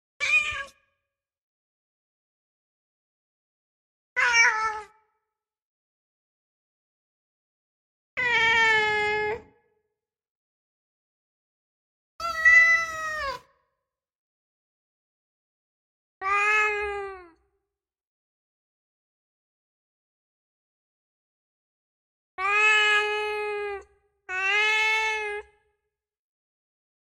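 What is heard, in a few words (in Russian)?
звуки кошек требовательное мяуканье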